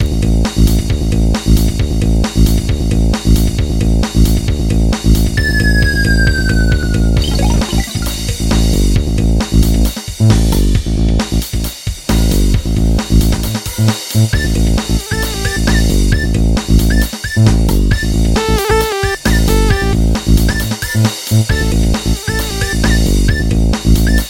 nokia midi; nokia box

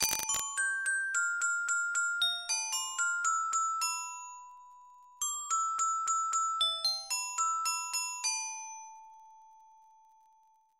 Music Box; Mr Love Queen's Choice

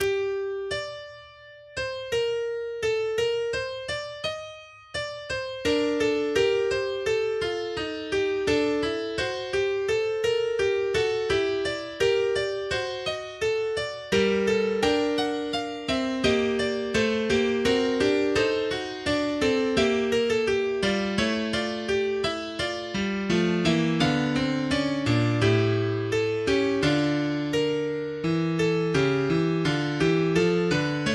Fugue in G minor

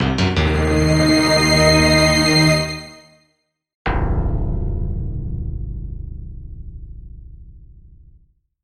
Bass Remix

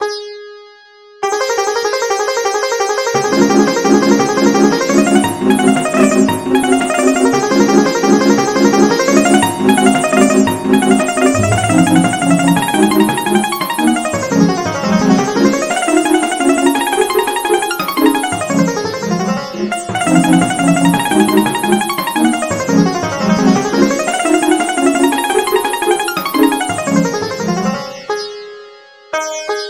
Waltz in D flat major; Opus 64 No 1; Minute Waltz

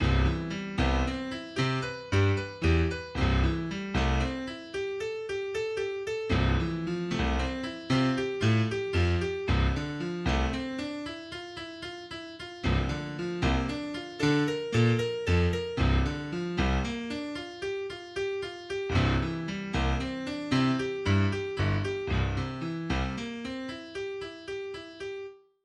melody groups violins winds

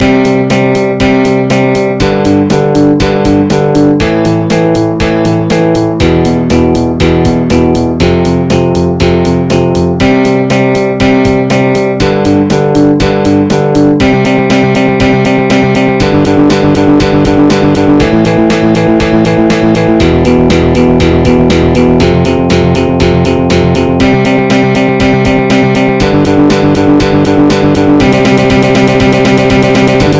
MIDI Test

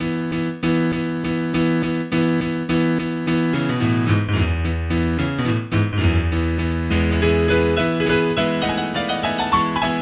Mazurka in E Major Vivace

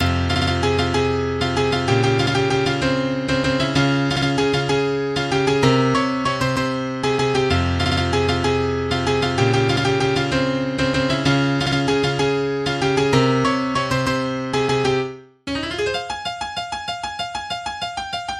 flute

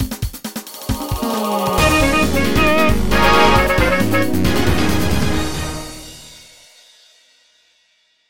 Zone Clear; Sonic CD Japan

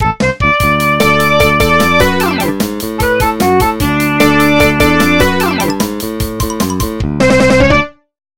Title Screen Level Completed

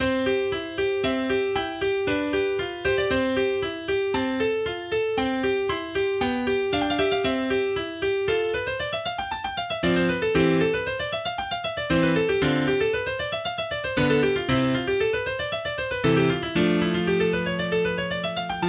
PIANO SONATA K545 1st Mov

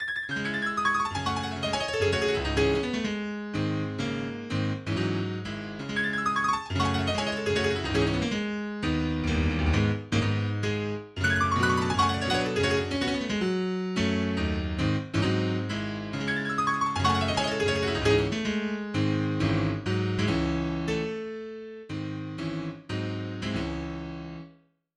Prelude in C Sharp Minor

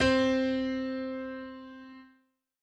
Perfect Octave C

